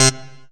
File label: Synth Shot; Vengeance Samples